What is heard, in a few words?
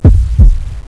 heart